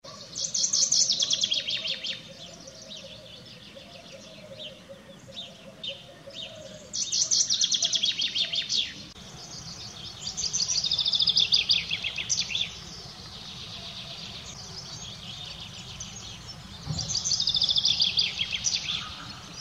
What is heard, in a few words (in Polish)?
zięba; ptaki